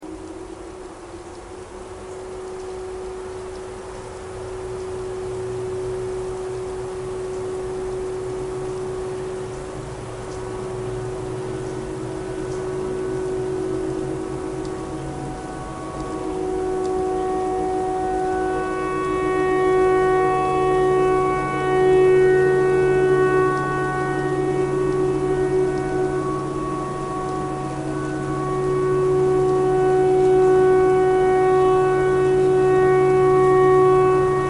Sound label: tornado siren tornado siren thread pentatonic